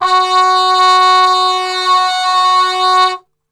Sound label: F#2, Brass, TRP CUP MUTE; Best Service; Brass Super Section; 90 s Sample CDs